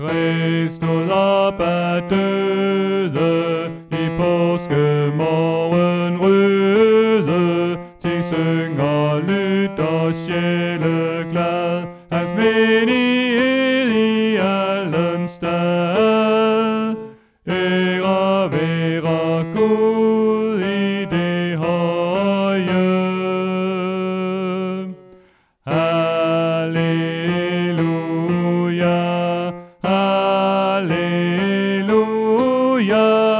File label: Tenor